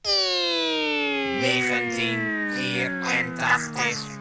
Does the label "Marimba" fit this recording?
No